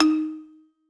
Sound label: marimba